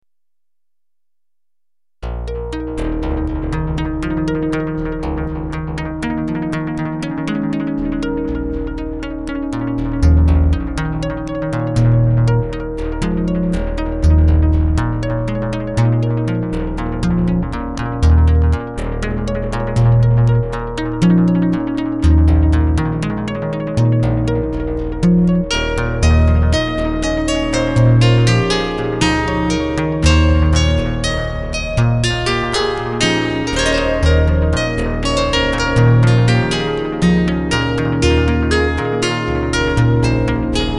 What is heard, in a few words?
Little Sea Waves